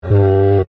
Dinosaur Bleat, Creature; Animal; Dinosaurs; Animals; BlastWave

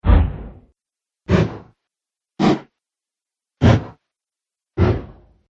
Slow Passing Air Swooshes, whoosh

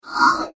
Enter The Starship, spacecraft; star wars / trek style games; Andrew Fleming

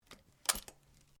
SHOTGUN BAIKAL 12 GAUGE DOUBLE BARREL OPEN STEREO

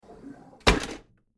Drawer Kitchen Close; Household; Drawers

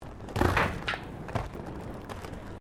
ROLLERBLADING VENT; Sports

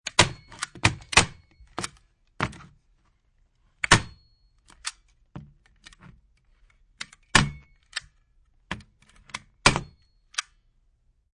Staple Gun, Wood, X4; Staple Guns; Machines; Airborne Sound

